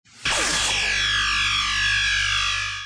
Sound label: emp